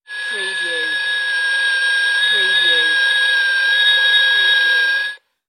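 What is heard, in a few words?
Toy Tea Kettle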